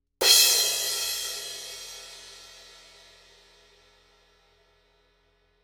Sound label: Paiste 2002 18 Crash Harder Hit Cymbal